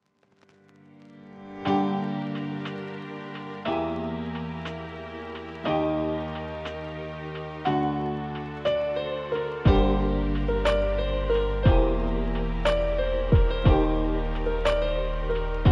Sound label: Instrumental Lo-Fi